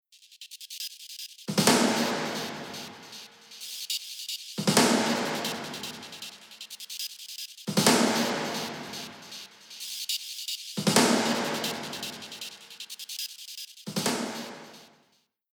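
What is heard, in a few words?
Future Bass Rhythm Loop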